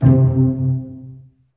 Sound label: STR SEC, ORCHESTRA; Roland - LA Composer vol. 1